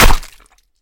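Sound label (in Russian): сабельный сокрушительный удар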